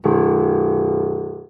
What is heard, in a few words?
piano b0